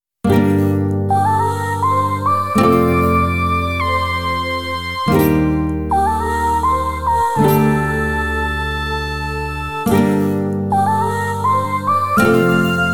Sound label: brass minus